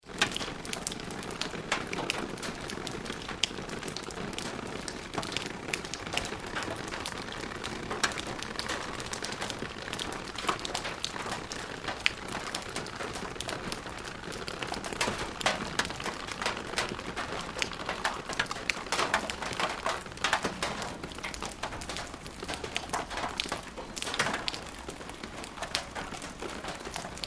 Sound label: Rain Water running from Gutters and Dripping on Metal shed; Nice drips and runs; Tom Hutchings